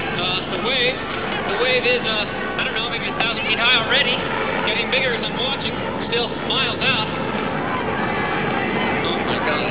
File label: wave